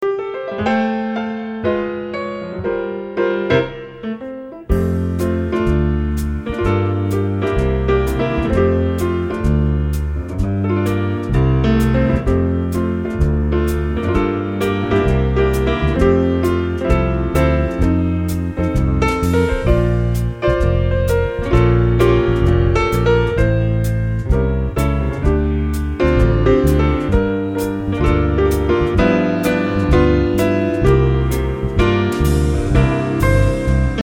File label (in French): Le piano de la plage